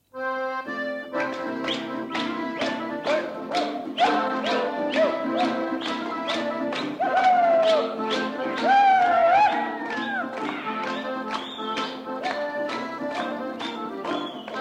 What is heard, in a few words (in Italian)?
Tarantella con strofette Rodi